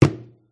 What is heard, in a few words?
pub pint glass place on beer mat; field reports